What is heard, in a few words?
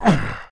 pain; minsc; Idle Champions of the Forgotten Realms